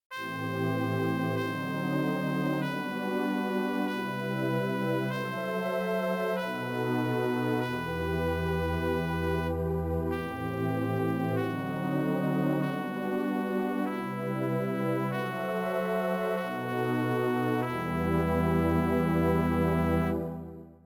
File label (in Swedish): Ledtoner